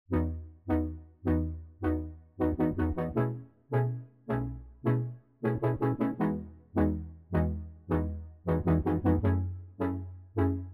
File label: Chords